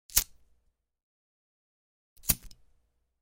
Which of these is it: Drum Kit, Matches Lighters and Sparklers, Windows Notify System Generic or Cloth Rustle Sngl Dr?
Matches Lighters and Sparklers